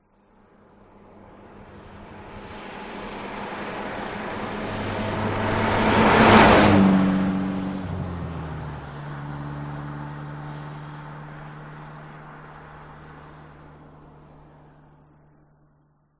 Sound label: Aston Martin By Fast; audio hero